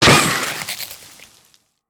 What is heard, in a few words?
Trilobite burst